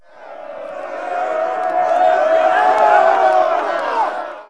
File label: crowd; KABOOM! - Wild and Wacky Sound Software; citylife; crime